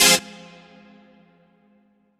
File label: Dance Mega Synth; Best Service; 90 s Sample CDs